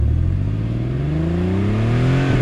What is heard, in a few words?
Porsche